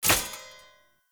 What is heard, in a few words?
Short Sword Throw Medium Impact Metal